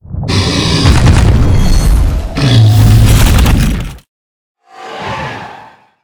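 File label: boss Sphinx death, monster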